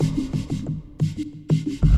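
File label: Music Soft Ground 120; From Dust; ps3; xbox 360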